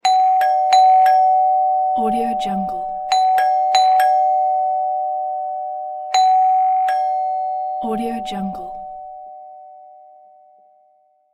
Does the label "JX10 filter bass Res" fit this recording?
No